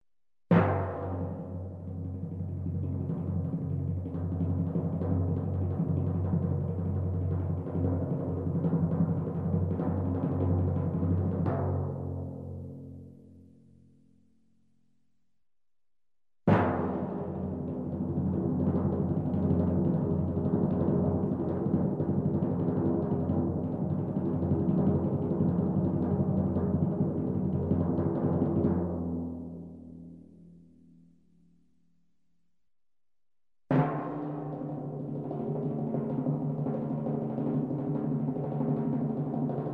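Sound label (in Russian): звуки барабанной дроби сборка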